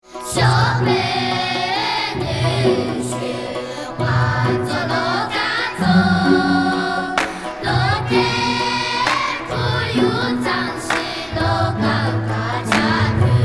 Chinese Kids Sing and Drive

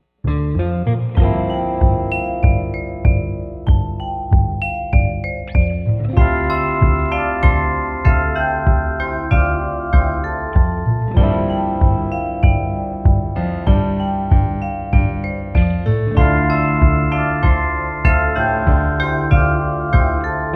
Kick Drum

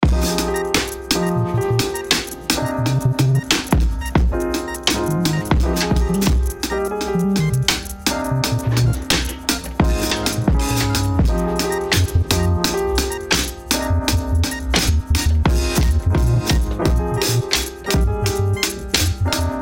drums

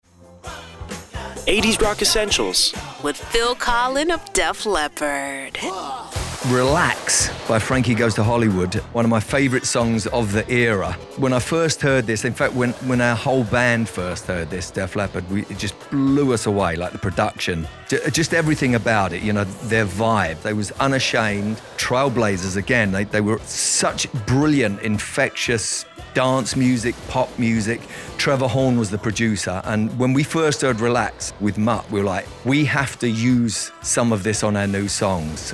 80s Rock

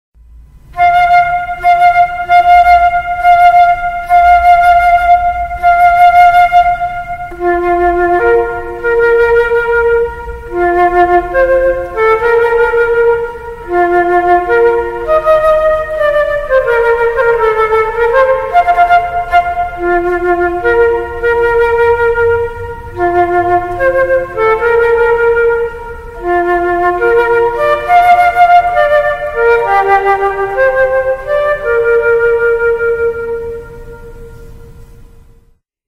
Wagner Bridal Chorus Solo Flute

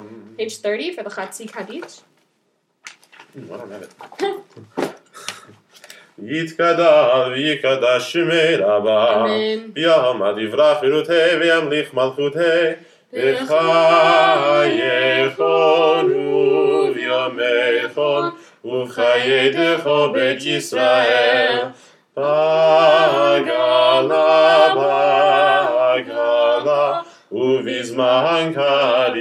Hatzi Kaddish

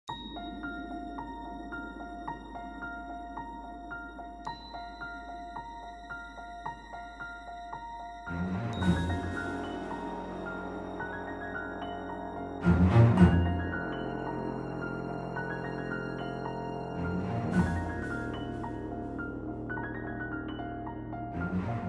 Suspense Thriller

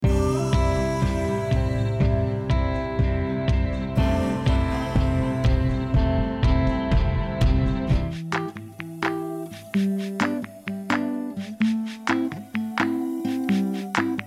Indie Pop mix

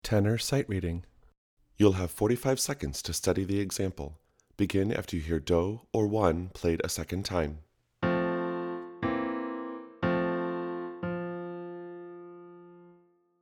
Tenor

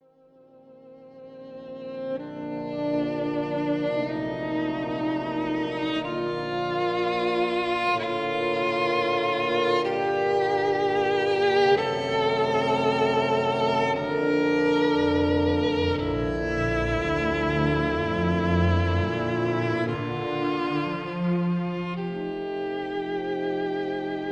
Violin; Sibelius; Finnish; Oramo

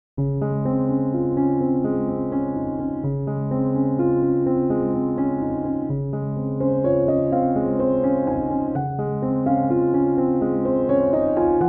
Piano Idea

